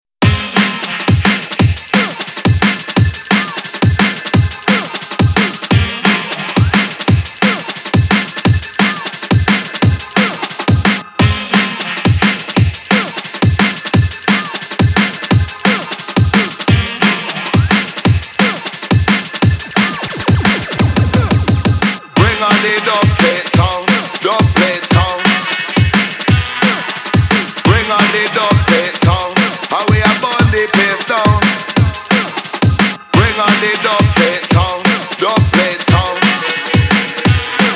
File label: Dubplate Sound